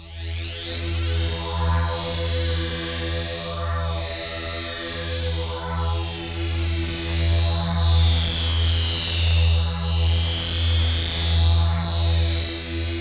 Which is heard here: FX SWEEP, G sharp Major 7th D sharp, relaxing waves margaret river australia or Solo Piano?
FX SWEEP